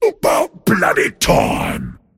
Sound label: cast pain; dota; pudge